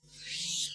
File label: holo delete; federation